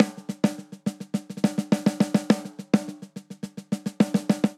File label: Country Snare Only 209 bpm; 4 4; MIDI Clips; Ableton; Factory Packs; Drum Booth